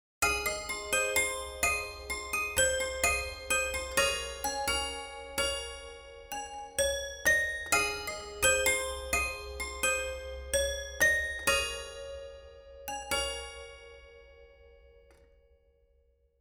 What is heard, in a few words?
Toy Piano Classic